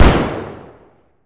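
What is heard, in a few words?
weapon lmg fire player atmo int; gmod; viper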